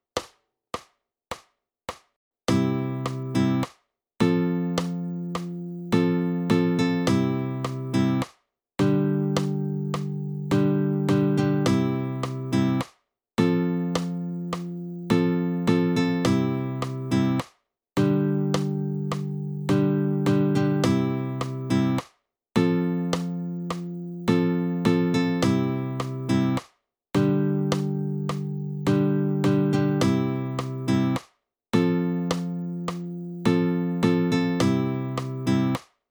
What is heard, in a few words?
Strumming Pattern